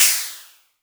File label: Percussive FX; Percussion; Vengeance Samples